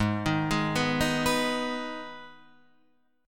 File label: Ab Minor Ab down Guitar Half Step Down